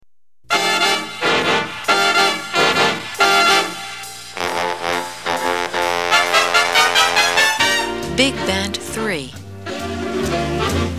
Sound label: bigband